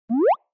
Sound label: Anime Motion Dry